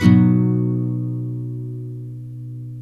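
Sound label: NYLON, B MAJOR UP, CHORDS; Spectrasonics; Hans Zimmer Guitars VOL-1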